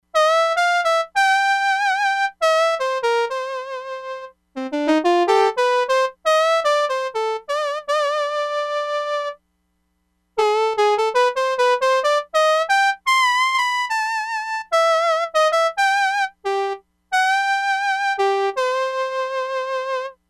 HARMONICA, Crumar Bit 99; Factory Programs

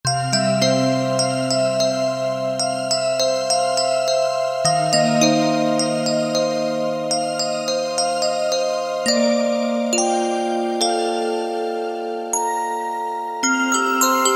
LULLABY, Kurzweil K2000; SYNTHS; Kurzweil K2000 Value Pack; Extra Programs

